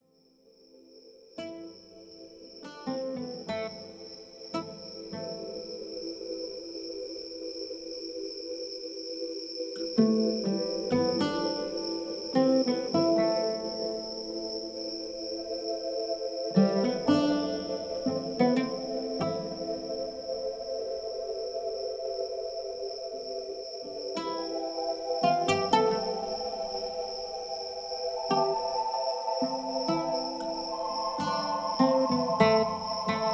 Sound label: rainforest at night